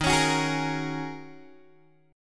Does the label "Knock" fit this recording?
No